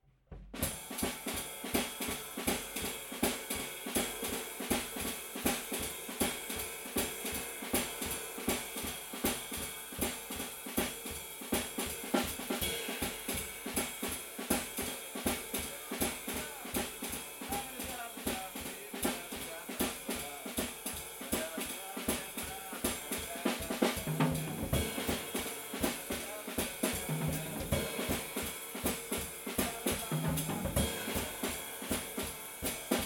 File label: Shuffle